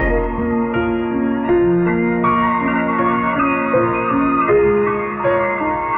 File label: piano, sad loop